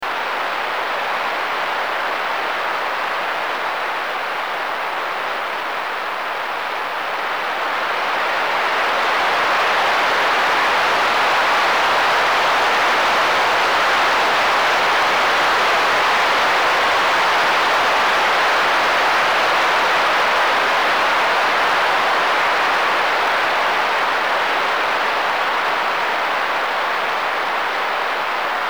solar burst type 3

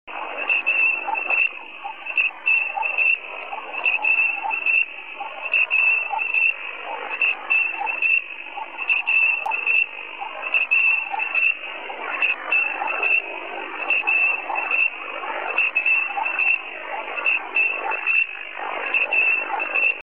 mode ita2 50 bauds meteo

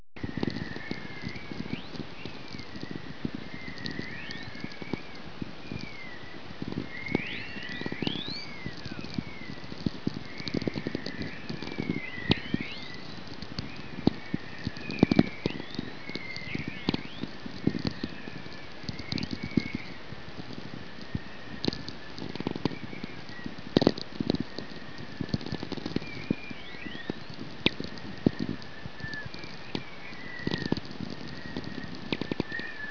VLF aurore whistlers pure, Alaska

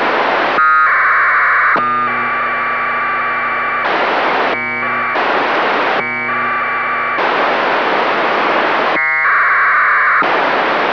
packet bursts, Radio

